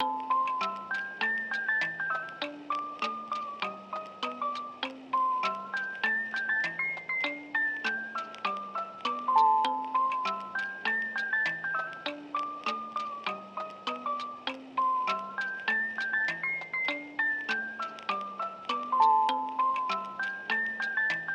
Ragtime Melody + Rhythm; don t starve together

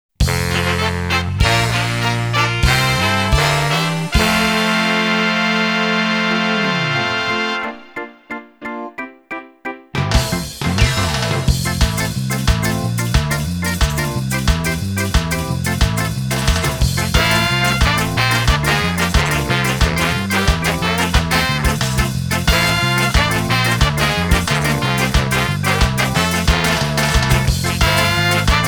Ska; pop'n music 12

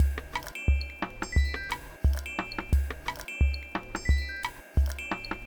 Drum Loop; Proteus

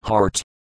Heart

